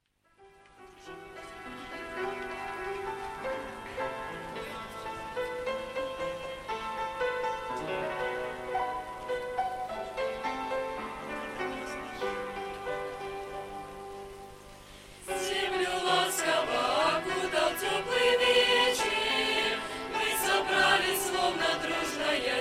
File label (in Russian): Землю ласково (Пение, Сотрудники лагеря)